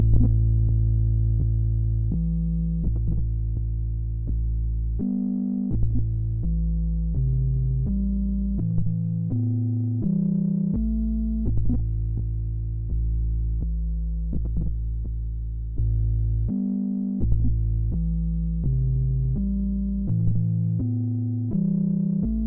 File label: sid MOS 6581